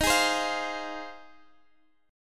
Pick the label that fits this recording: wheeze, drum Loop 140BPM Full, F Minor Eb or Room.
F Minor Eb